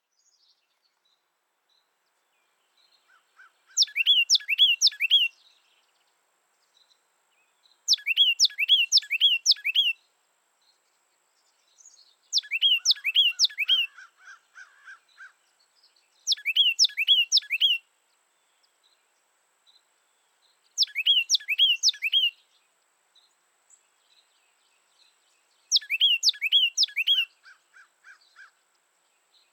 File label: Carolina Wren, Thryothorus ludovicianus ludovicianus